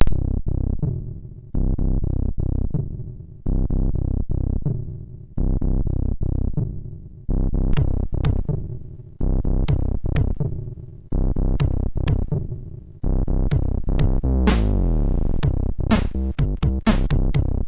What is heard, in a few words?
Rave, MOS6581R2